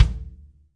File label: yam kick, Multisampled Real Kit; REAL LIVE KITS